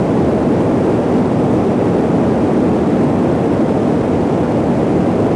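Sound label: waterfall huge loop; water splashes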